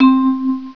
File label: VIBES C4; Star Trek JR Audio